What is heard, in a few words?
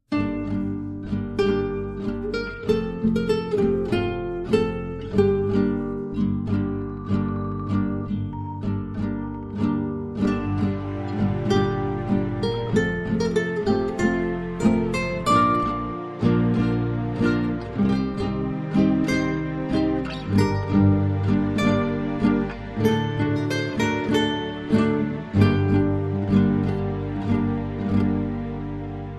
Acoustic